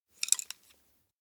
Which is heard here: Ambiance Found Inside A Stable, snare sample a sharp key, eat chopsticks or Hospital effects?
eat chopsticks